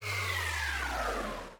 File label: anim level start